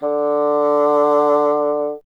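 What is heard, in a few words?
wind bassoon D; Miroslav Vitous - Solo Instruments VOL-1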